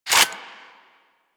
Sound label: Heavy Shotgun Reload Start; Weapons; Warframe; Tenno